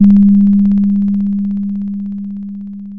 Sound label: pop, Sound of a doorbell